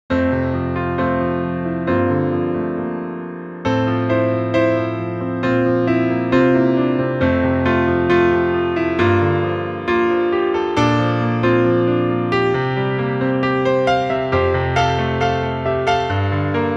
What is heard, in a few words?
Studio